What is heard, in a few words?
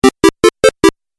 8 Bit Style Music Accent; Video Game